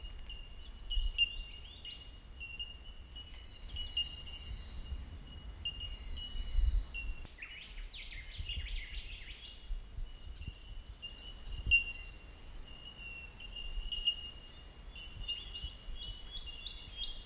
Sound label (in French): Australie Blue Mountains oiseaux